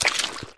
foot on mud

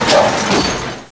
walk

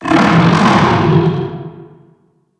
alien

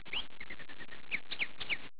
birds

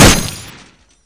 fire, weapons; paranoia; rpk

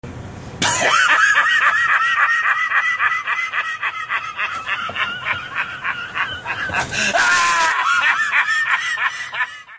wheeze

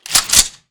shotgun pump

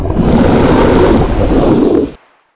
Shark attack

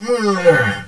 PAIN

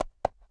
step

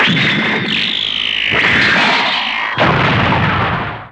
saber

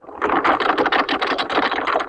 GRILLE, ambience

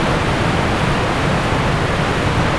waterfall huge close

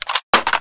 clip release

